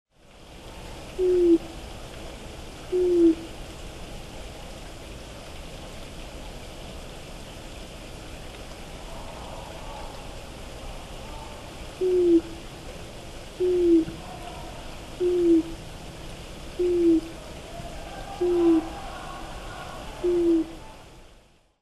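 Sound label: Japanese Night Heron; mizogoi; hyogo; koe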